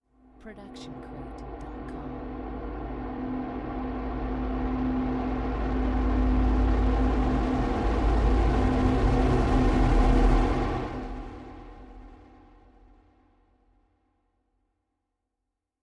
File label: building growl